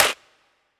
Clap

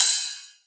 Ride; FLPRO

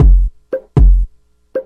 Loop

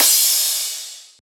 Crash

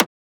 Transient; FLPRO

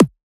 Percussion; FLPRO

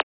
Transient; FLPRO

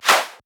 Shifted Clap; FLPRO

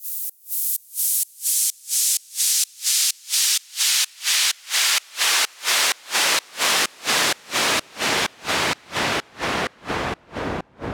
Fall Side Chained

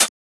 Closed Hat

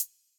HiHat

